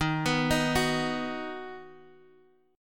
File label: D sharp Minor Sharp 5th D sharp, Guitar Open D; 13,14,13,12,x,x; chordshape